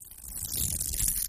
Velcro is pulled apart at various speeds. Tear, Velcro Rip, Velcro